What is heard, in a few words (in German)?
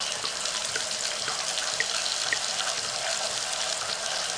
Atmo